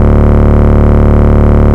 Bass D, Root D; Vengeance Samples; Vengeance Ultimate Bass

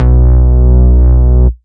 Root B, Ultimate Bass; Vengeance Samples

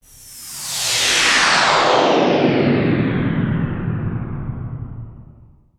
Downlifter

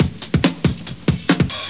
Breakbeat; Vengeance Samples; VEC2 Loops

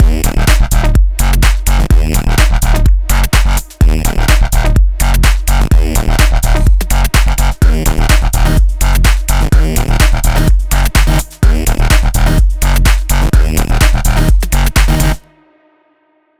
126BPM Mix; Vengeance Samples; Lineup Kit

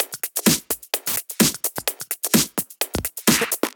Drumloops 128BPM

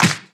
Snares & Claps; Vengeance Samples

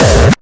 Bassdrums Hard Kicks; Vengeance Samples

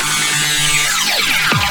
Loops Fills; Vengeance Samples

